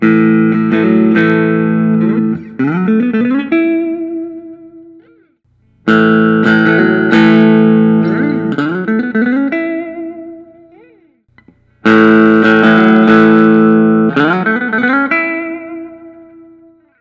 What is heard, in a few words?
gibson custom sg vos